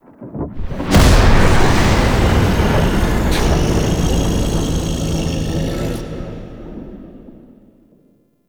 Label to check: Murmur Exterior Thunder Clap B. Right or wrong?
Right